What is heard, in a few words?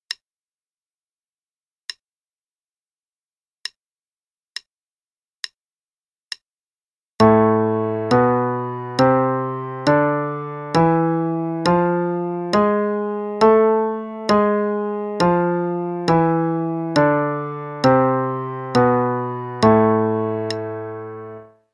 a harmonic minor Scale (qn=69); CM Level 2